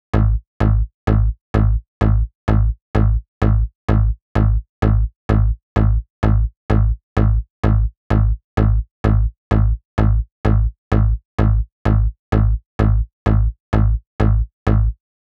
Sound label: 128 BPM Drop Bass, Root Amin; Vengeance - Essential Clubsounds Vol.5; VEC5 Demo Constr Kits; Highway Kit; Bassline